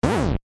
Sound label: Boss Mouth; Boss opening its mouth (Helmasaur King); Zelda